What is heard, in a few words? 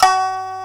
shamisen F3#; AKAI S6000 CD-ROM - Volume 1